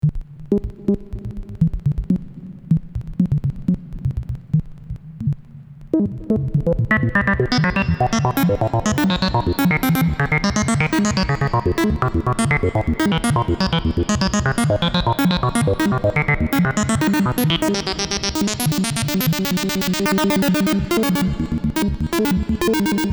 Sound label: MOOG MAVIS LBD Sequential Controller w RE 301 Space Echo